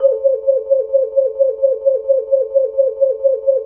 Vibratone Fast; Roland BK-5; Percussion

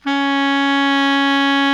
BARI MF C#3, saxophones; Greytsounds - Philip Wolfe David Crozier's Saxophones; BARITONE

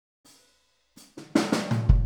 Boogaloo Kick, 82 BPM; Sonic Foundry (Sony Creative Software); Drums from the Big Room The Mixes

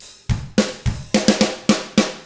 POP ROCK, Drums; ILIO; Double Platinum Drums 1

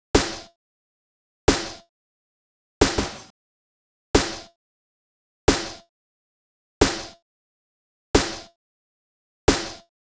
Snare, Snare Patterns; Beat Components

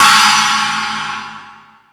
Crash 2, drum machine

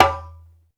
African Perc

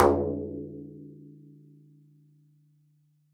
tar riml f, hand drums; SoundBytes - Early Patches

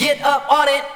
VOX SHORTS; Megabass Remix! 2

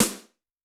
Rock H, Yamaha DTXpress-II snare

